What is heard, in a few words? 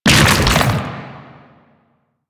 Octopede Horrors Arm Spawn A, Sounds Enemies; Warframe; Man In The Wall